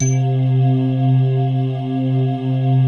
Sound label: BELL LIGHTS, JV PADS; Pro-Rec - Synthsonic